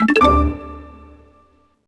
IM Contact Incoming; msntv2

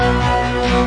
eerie loop; Loops

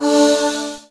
Chat Whisper; msntv2

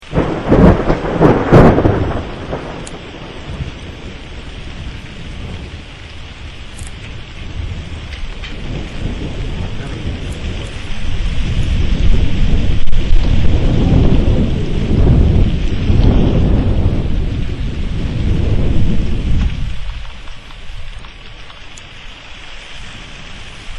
thunder crack

